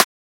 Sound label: Transient; FLPRO